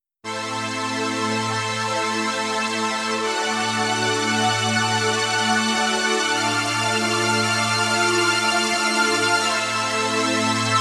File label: SOLINA